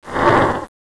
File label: baby death